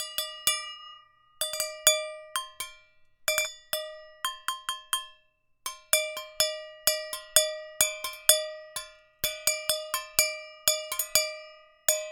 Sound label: Frigidira 126bpm